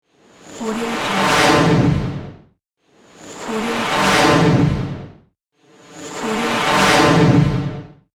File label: jet swoosh